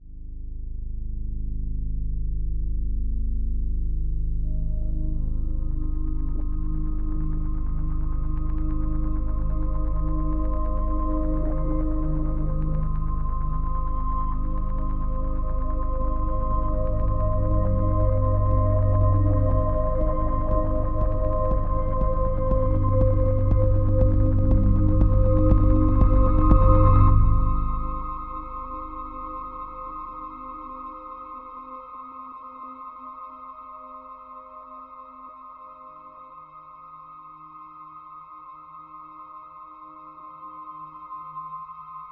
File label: music junkyard night end